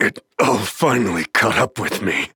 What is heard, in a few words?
Death; Darklord Skin01; Zhin; Paladins